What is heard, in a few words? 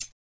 button Up; football